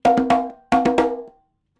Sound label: 110 Dance Congas End; Percussion; CongaBongo; Pro Tools Essential Loops; Digidesign; Dance DJ